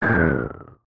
Monster Attack

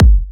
Mushroom Kick

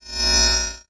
Transmat

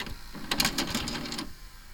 VCR

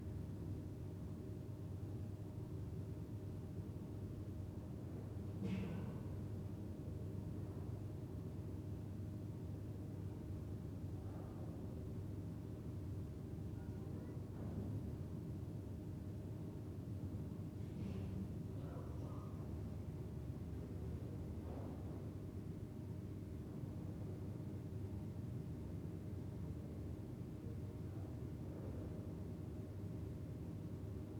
Room Tone Pasillo Hotel

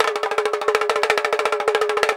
Whirling Drum

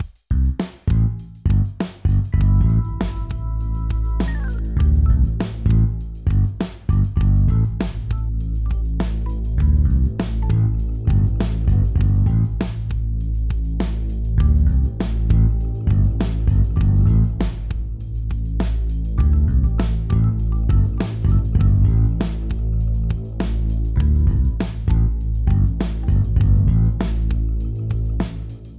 Retro Crime Movie Loop

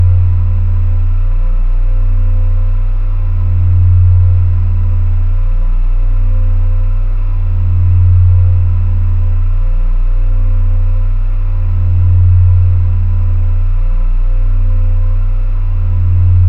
Forcefield Hum

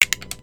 Lego Brick Fall

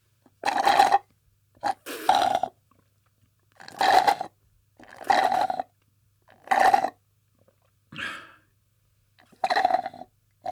Straw Drink Sip

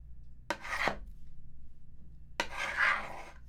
Cutting Fabric

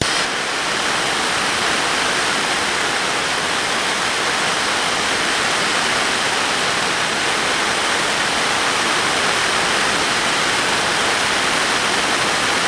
Blowtorch Light And Run Flame Good Detail On Off

